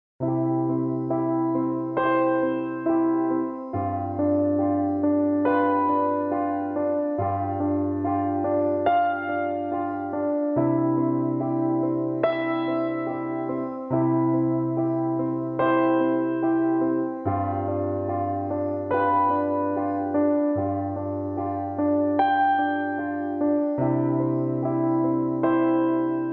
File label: Distant Bells